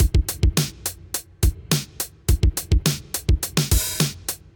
707 Beat, Drum Machines; Freshen Up; Ableton

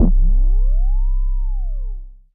Dusty Bass; Ableton; Factory Packs; Chop and Swing

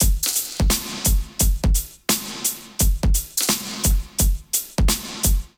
Wah Soul Kit groove 86 bpm, Drums; MIDI Clips; Ableton; Chop and Swing

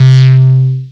Hottbottom C2, Analog Synths; Ableton Foundation FreePacks

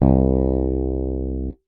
Fretless Bass Open; Guitar and Bass; Ableton; Factory Packs

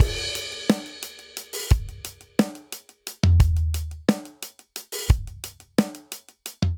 Straight Five Rock Breakdown 5/4 177 bpm; Ableton; Factory Packs; Drum Booth

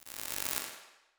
Zipper Up, Reverbs; Convolution; IR Samples; Kontakt 5; Unsusual Reverbs

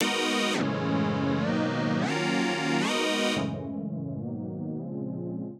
Deep stab G# Minor 171 bpm, synth; MIDI Clips; Tonal; Ableton; Skitter and Step

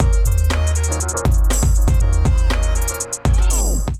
Kit, Drums; Paradise Kit; Ableton Factory Packs; Beat Tools

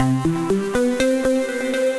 Shimmer Arp, Synth Rhythmic; Ableton; Factory Packs; Synth Essentials

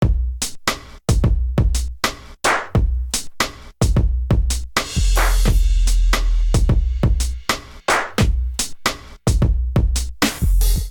Groove 88 Bpm, Drums; MIDI Clips; Move up; Ableton; Factory Packs; Chop and Swing

